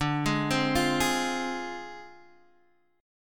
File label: D Minor 7th Flat 5th, Guitar Standard; Dm7b5; arpeggiated